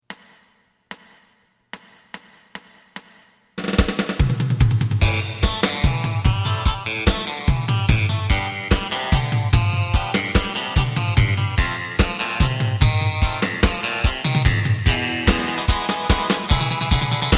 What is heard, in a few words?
bass